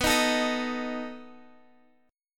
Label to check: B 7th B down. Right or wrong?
Right